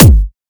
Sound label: Kick G